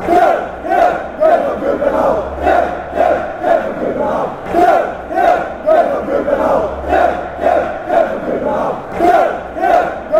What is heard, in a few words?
fc copenhagen chant